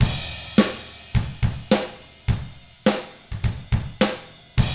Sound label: 105 POP RK, Drums; ILIO; Double Platinum Drums 1